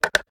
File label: reload fast mag in; reloads; gmod